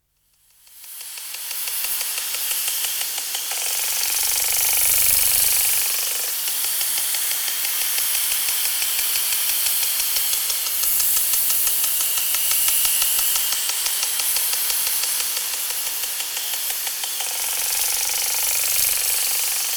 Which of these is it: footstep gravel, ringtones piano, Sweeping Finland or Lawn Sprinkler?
Lawn Sprinkler